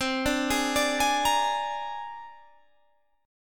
C 7th Suspended 2nd Sharp 5th, C, down, Guitar, Standard; arpeggiated; chord; C7sus2#5